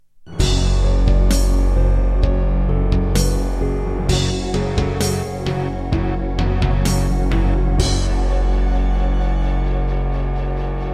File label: Introduction, Casio GZ-50M; Doom (Sigil)